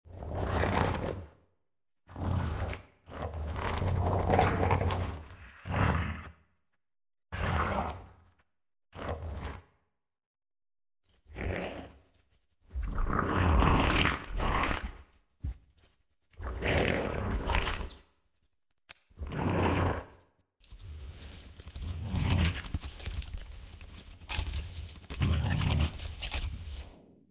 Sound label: black dragon in swamp